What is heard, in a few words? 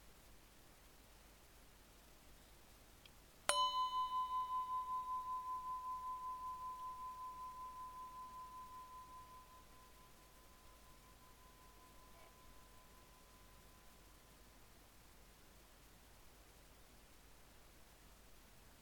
Ganta Bell